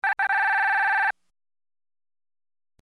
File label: alert, computer